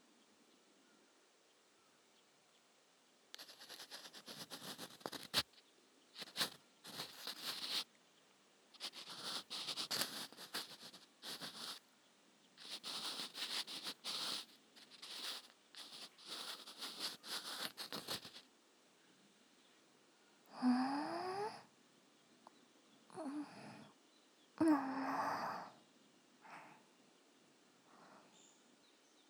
耳かき (右); お屋敷づとめ。Vol.8―南城花梨 お日様とおてんば娘―

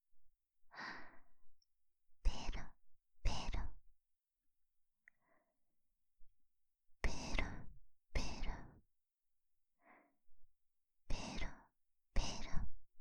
ペロペロ, ASMR, 右耳

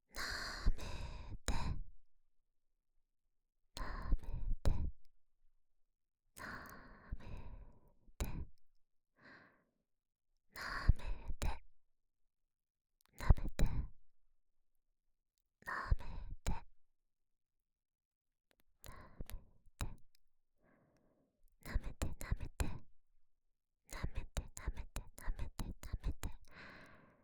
舐めて, ASMR

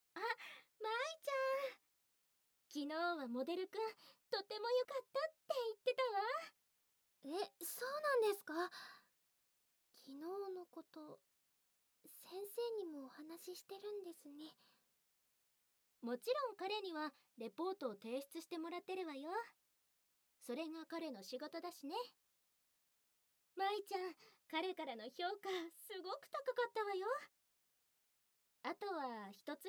耳舐め; 耳舐め卒業試験～まさかの内容！?

